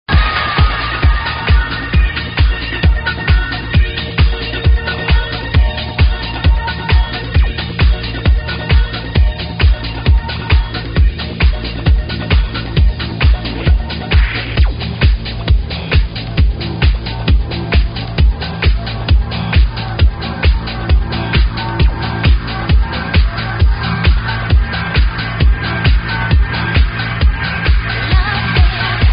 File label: kick; multitrax